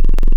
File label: Sine 909; Wavetables; Synthetic's Serum Collection; ESW Core Wavetables; Echo Sound Works Core Tables